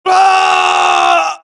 death, Axis; mohaa; Mission 1

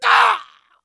death; mohaa; dialogue

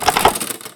mg switch; cdogs

